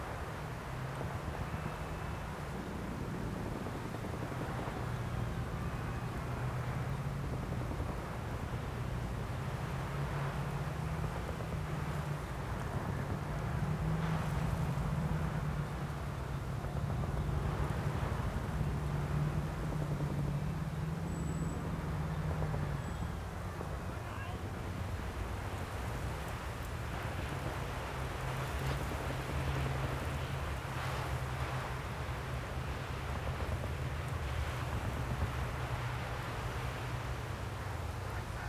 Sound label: town battle in far distance; WAR